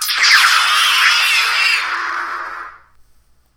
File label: spell